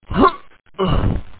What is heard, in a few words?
fjump